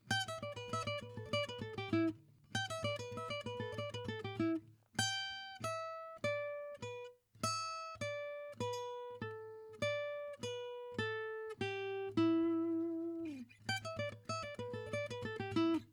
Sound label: Pentatonic Lick, guitar